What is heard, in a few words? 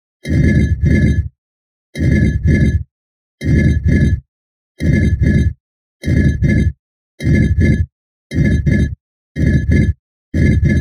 Stone Eyelids Blinking Using Concrete Slab; Freqmans Concrete Slab